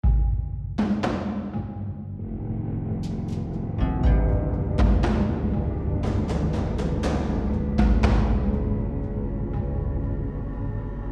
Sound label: Tension; AB Tension 3 High Chunk 4